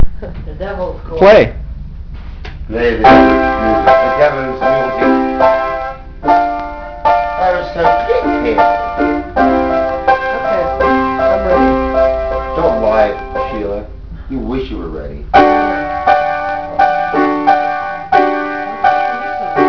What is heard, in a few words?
piano